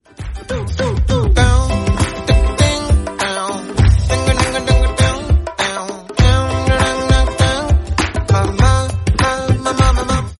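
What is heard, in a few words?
background music ringtone; Tamasha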